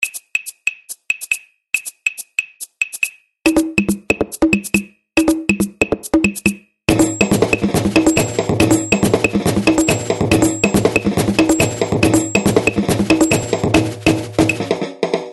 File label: carnival rio batucada